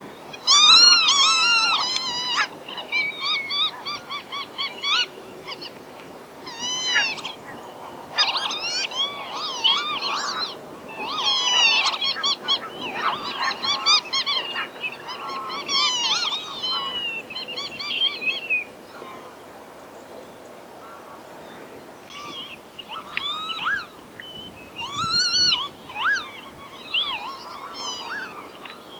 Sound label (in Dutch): kievit herfstroep